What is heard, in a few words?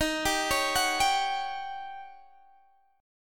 Eb 7th Sharp 9th, Eb, Guitar, Open G; arpeggiated